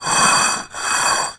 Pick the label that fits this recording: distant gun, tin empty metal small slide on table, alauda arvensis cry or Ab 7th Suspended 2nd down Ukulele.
tin empty metal small slide on table